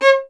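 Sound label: STR violin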